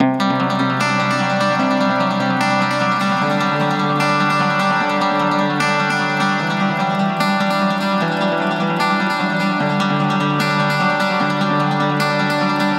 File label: guitar loop 150 Bm; runaway